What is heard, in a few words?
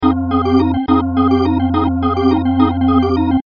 Drawbar Organ